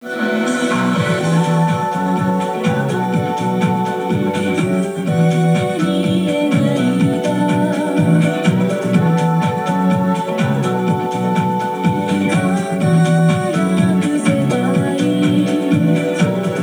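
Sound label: background music karaoke; yakuza ryu ga gotoku kiwami 2 pc gamerip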